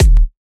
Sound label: kick noise